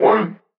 vocal one A; SD AURORA Sample Pack; Slate

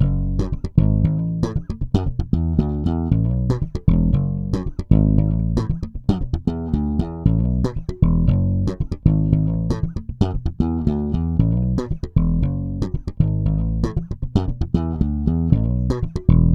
Bass loop 116 F#m, Electric Bass Loops Direct; Slate Digital BASSHOLE Sample Pack

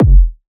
kick bouncey house; Drum Sample Pack; One Shots; Slate; SEISMIC

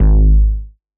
808 Bass Reso G#3; Slate 808 Deep Dive; SD Signature 808s

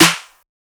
clap woosh; Drum Sample Pack; One Shots; Claps; Slate; SEISMIC

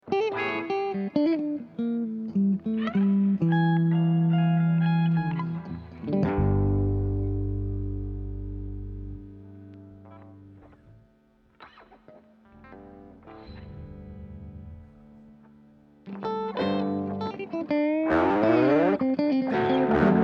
Guitars and Bass

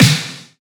snare; Slate; SD MIDNIGHT Sample Pack; ocean

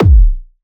kick loft; Drum Sample Pack; One Shots; Slate; SEISMIC

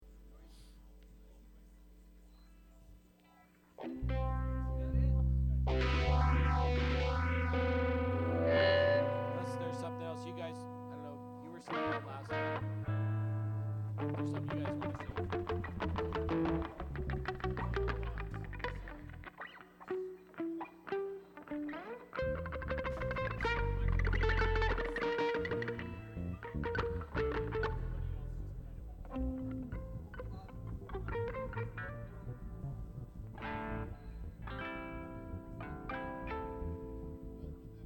Strings Mix

